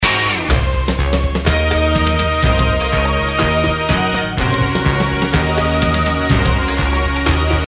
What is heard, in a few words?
drums